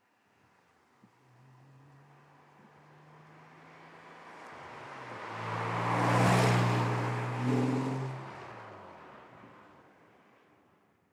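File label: sports car exterior passing by medium speed; verkehr-transport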